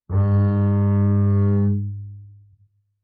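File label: Bass arco fortissimo sul E Bb1, Double Bass; MIS Pitches - 2014; Strings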